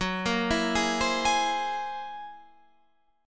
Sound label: F sharp 9th Flat 5th F sharp Guitar Half Step Down